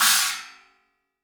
DW Green Maple Cymbal Grab; 1999 DW Green Maple Kit; One-Shots; Sonic Foundry (Sony Creative Software); Vital Drums

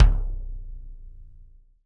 TD-20 Tom A, Roland TD-20 Toms

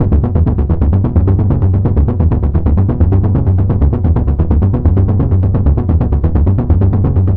NRG Bass, Trance; Sonic Foundry (Sony Creative Software); Trance NRG VOL-1

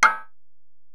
Ac Perc, Drum Machines Alesis DM-PRO

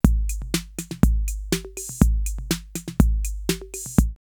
808 Steady Cool 122, drumloops, DrumMachines; X-Static Goldmine

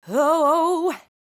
"Whoho", vocal phrases; Ultimate Vocals

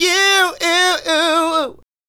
Male Solo, Hip-Hop and RnB Vocals

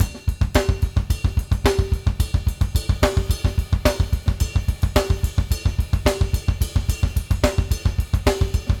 BASIC+CYM, Drumworks

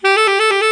TRILL G-A, TENOR SAX

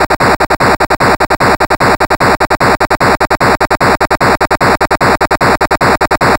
Atari 2600 Noise Hats; 8-Bit; Chiptune

